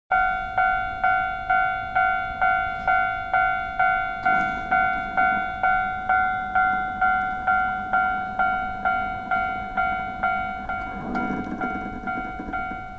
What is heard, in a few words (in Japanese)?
densya